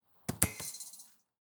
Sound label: Soccer Ball Hit Goal Metallic Pole Rattle; Vadi Sound